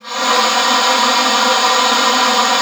LUFT, OB D.AMORE; Xsample VOL-7

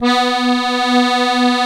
DIATONISCH, AKKORDEON, Alpine Volksmusik

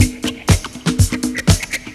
DISCO KRATZ; Soundengine - CSR-000 The Art of Transwaves; PERC WAVES

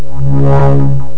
droehn; ST-XX Amiga Soundtracker Sample Packs

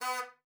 HUPE; Xsample VOL-9

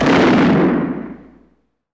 SCHUSSFX; Best Service; Advanced Mega Effects

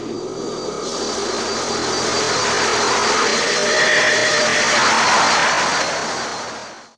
DUESEN; Best Service; Advanced Mega Effects

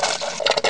klo; Amiga Soundtracker Sample Packs

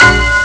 klavier; Amiga Soundtracker Sample Packs

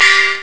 low schild glonk; Amiga Soundtracker Sample Packs

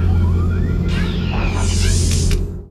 FAHRSTUH; Best Service; Advanced Mega Effects

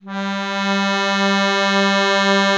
AKK VIOLISW, akkordeon; Best Service; Alpine Volksmusik

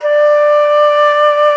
FLOTE SOFT; FLOETE; Best Service; Alpine Volksmusik

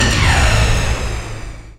RAKETE; Best Service; Advanced Mega Effects

